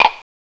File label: CLAVES; CMI Sound Library Rev 1.3